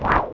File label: attack fist